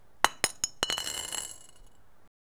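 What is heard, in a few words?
stone sharpening by other stone, crafting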